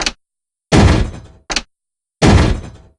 weight loss

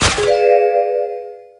lights on deduction; sherlock

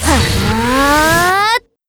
charge; arcueid

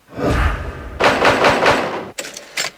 joint reasoning

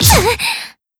hurt; arcueid

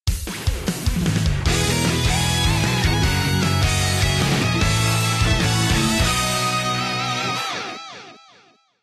victory; ctdt

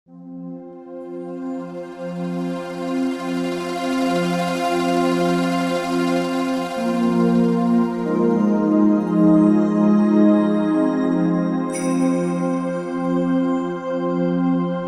a quiet prayer

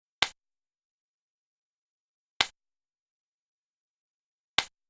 gavel touch; seishirou

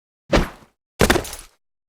jump

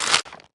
food crash; gregson

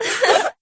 chuckle; yukari; shadow

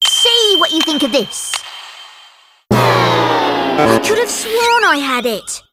lead up lose; plvspw; luke

